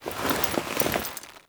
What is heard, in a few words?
Move Foley Long; Characters; Warframe; The New War; Veso